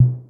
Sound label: Sequencing Note; Kit; Feel; MMFeedbacks; SequencingDemo